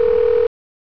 ringout

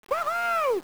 wohoo

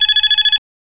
ring in

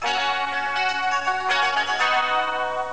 chorus, guitar; amiga

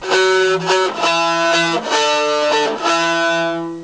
rev reverb, guitar; amiga

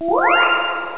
woing!

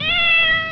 nyao

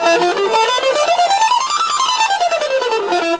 rev reverb ton, guitar; amiga

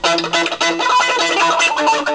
wah, guitar; amiga

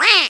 quack

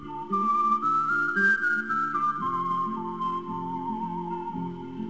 minimize; clint

